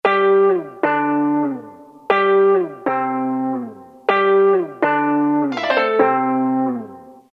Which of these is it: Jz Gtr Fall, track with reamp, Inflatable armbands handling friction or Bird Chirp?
Jz Gtr Fall